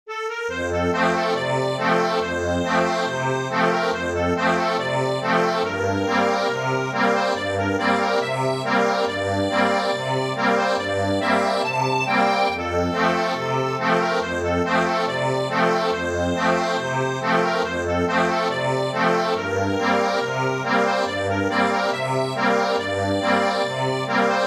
The gay dragoon, accordion

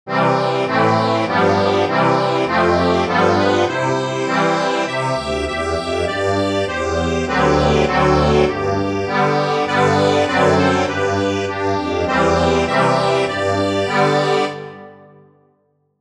accordion

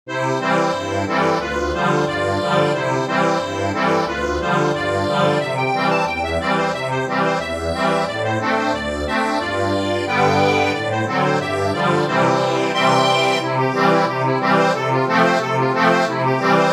ACCORDION

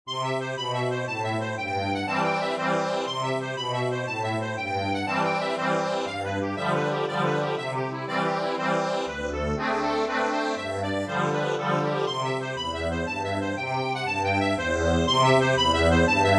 accordion

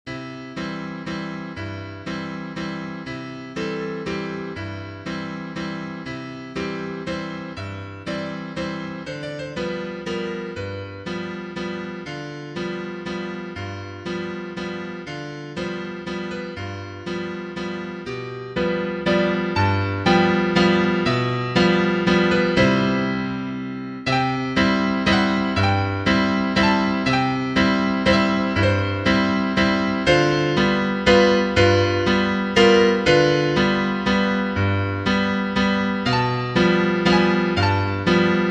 Mustard and Cress for piano

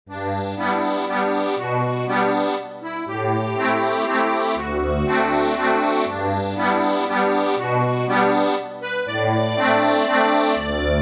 accordion

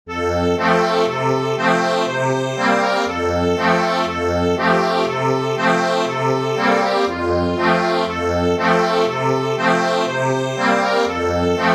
accordion, sheet music

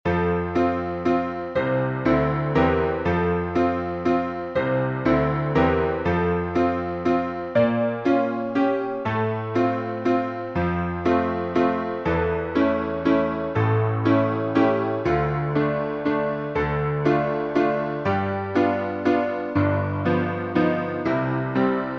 piano, sheet music

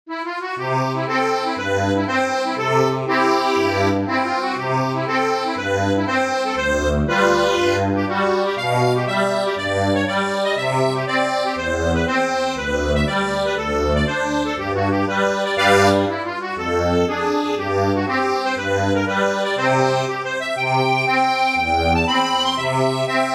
accordion

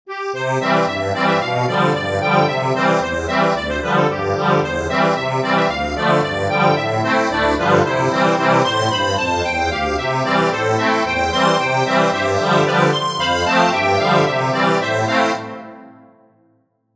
accordion

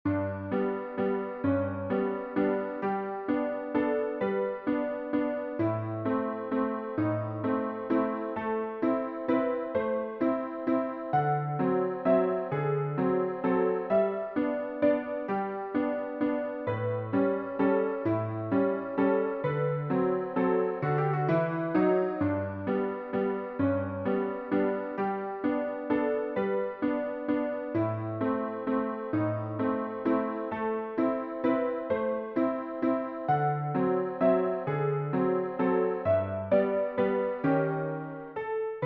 PIANO

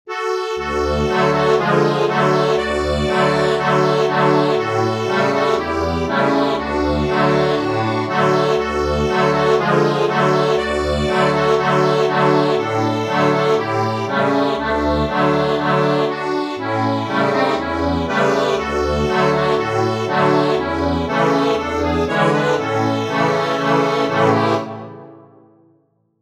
accordion

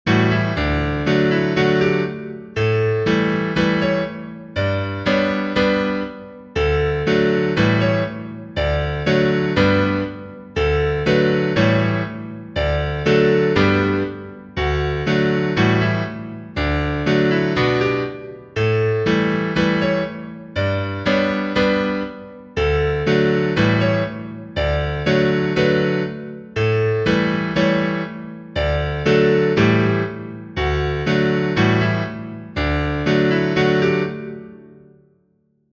lead

